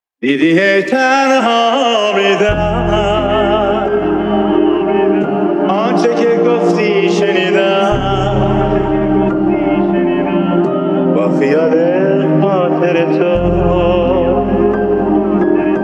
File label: Piano Version